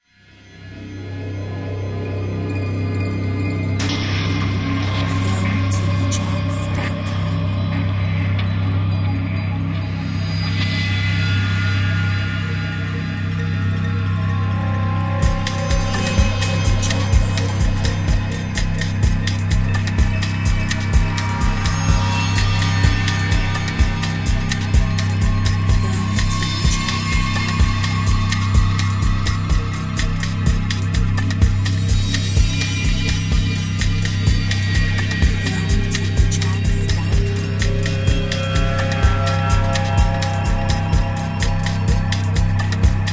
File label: Mysterious drone